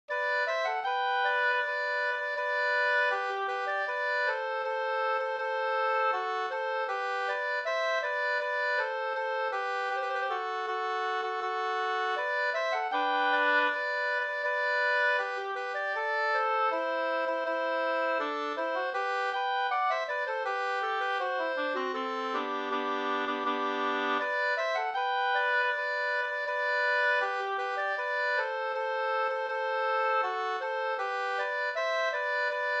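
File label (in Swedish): brudmarsch Gotlands brudmarsch 2 stämmor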